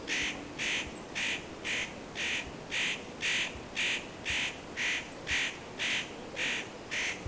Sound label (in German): tannenhaeher